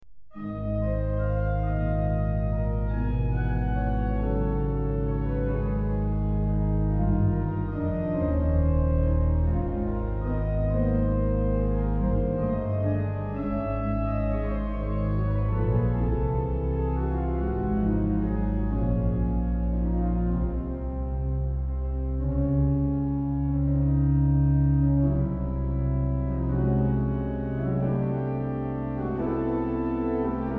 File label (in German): Sonate III für Orgel nach alten Volkslieder, orgel; Ach Gott wem soll Ichs klagen